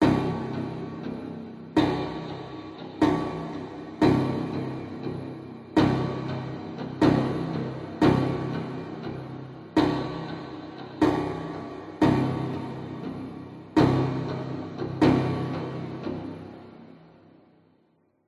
sound design cinematic dark tension rhythm stabs synth 120 loop; zapsplat